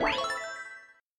right answer animation

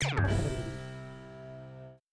game lose effect

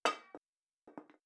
drop bottle

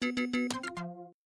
game lose effect

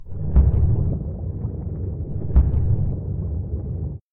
shark

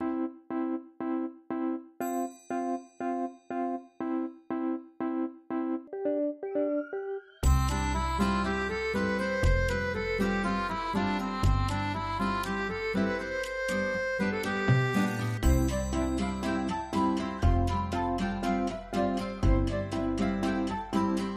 music cheerful; bgm

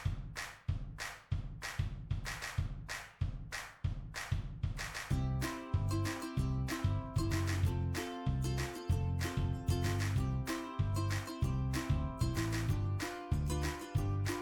music cheerful; bgm

